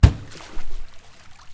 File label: water slap; E-MU Producer Series Vol. 3 – Hollywood Sound Effects; Water Slaps